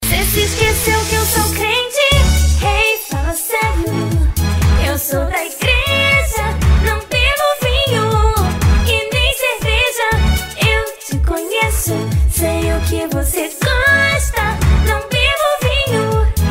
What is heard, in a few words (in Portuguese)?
parodia gospel